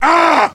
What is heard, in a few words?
man hit; Mount&Blade Warband